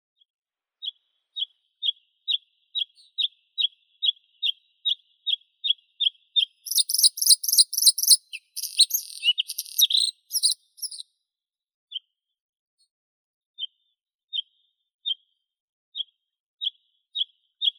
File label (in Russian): varakushka luscinia svecica, zvuki prirodi